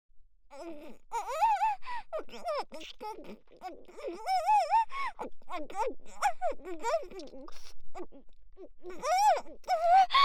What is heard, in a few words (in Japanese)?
フェラ・激しくフェラをする; ストレートお姉さん系カノジョ